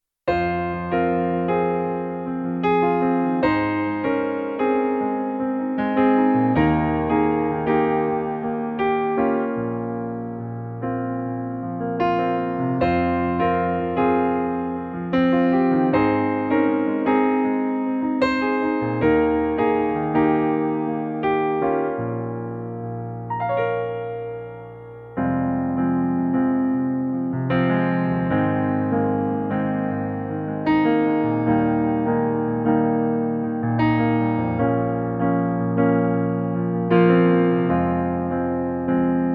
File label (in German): Pianoplayback für Sängerin langsamer